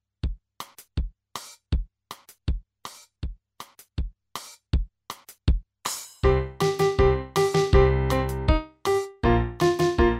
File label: Drunken Sailor, @80